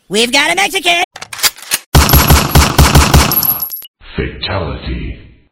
gunshot

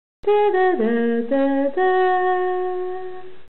creepy nokia logo

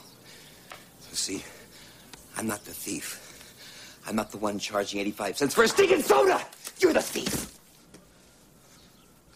falling down soda

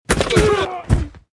hard football tackle impact with male grunts

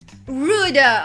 rude uhhh

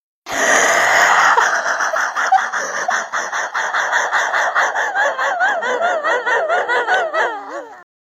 whee

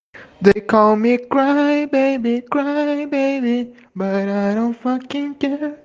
crybaby

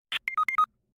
knocked sound effect; fortnite

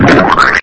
splurt

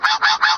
ahahah

